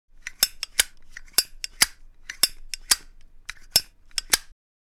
Zippo lighter handling opening and closing

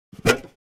Metal trash can lid opening

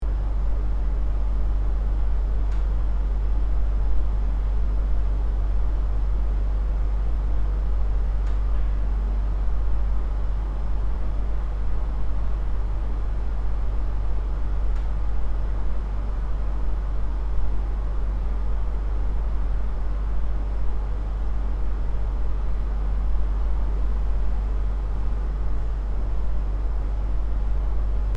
Hotel room ambience tone quiet loop

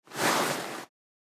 Leather fabric handling

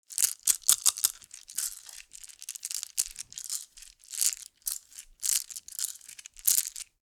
Game tokens moving clattering in hands

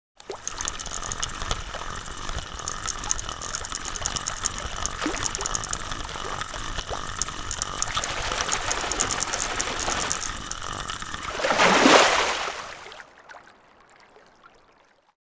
Fly fishing catching a fish boat waves splashing

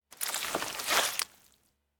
Cartoon slimy monster movement

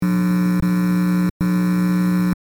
Electrical buzzing burst speaker glitch long; sound design

